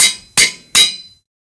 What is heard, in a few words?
metal bang; polarcomplex